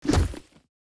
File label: order move